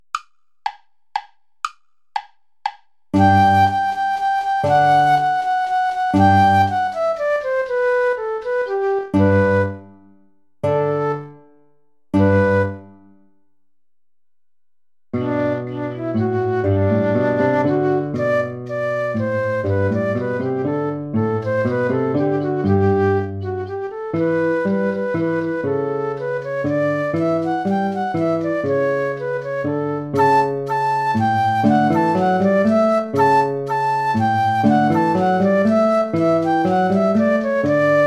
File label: play along without guitar; alter ungarischer tanz